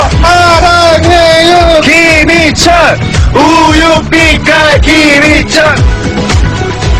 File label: Hee